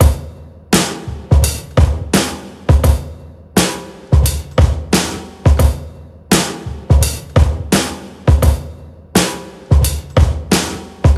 86 bpm drum loop d key, Free breakbeat sample - kick tuned to the D note. Loudest frequency: 1552Hz